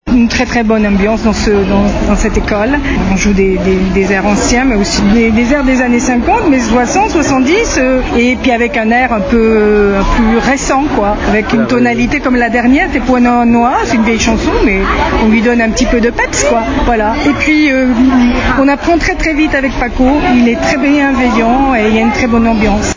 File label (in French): Ukulele; Marurai Ukulele School; ukulele basse et aparima revisites enflamment les coeurs du public